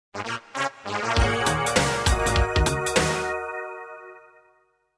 Jingles; 90 DDD Jingles

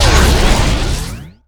Pickup Scifi Energy; SFX Kit

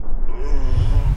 Punch Left Speed Up; Thug; Pitch Black - A Detective's Demise

